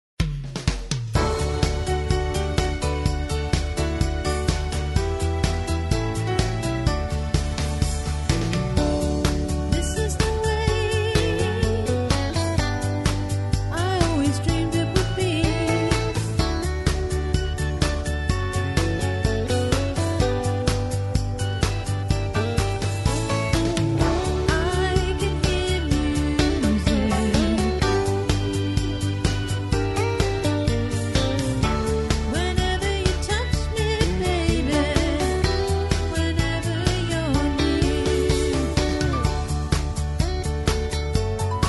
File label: backing vocals